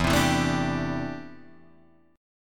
D 7th Suspended 2nd Suspended 4th E

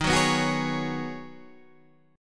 G sharp Minor 11th D sharp, guitar chord

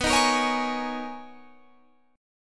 B Major 13th B Guitar Half Step Down

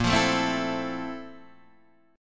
F Major 7th, Bb; Fmaj7/Bb strummed

